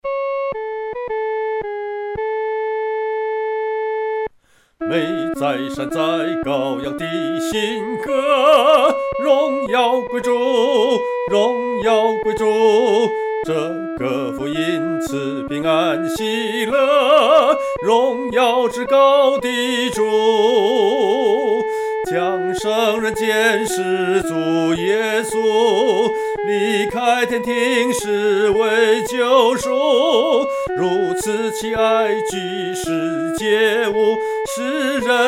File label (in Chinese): 独唱 第一声